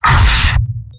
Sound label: pain sharp; soldier